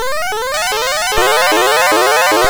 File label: Synth Sample III, MOS6581R2